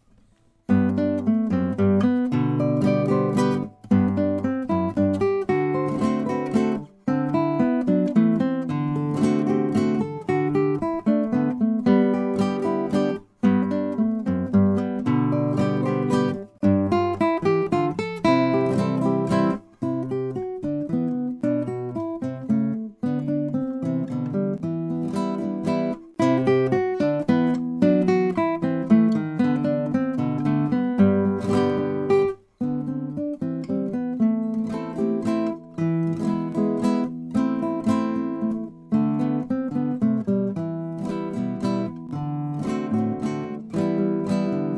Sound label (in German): duett